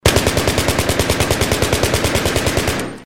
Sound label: ump auto indoors